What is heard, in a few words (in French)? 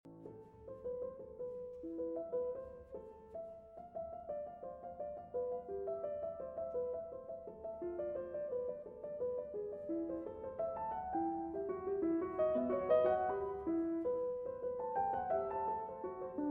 Fugue en la Mineur; BWV 543; II. Transcription By Liszt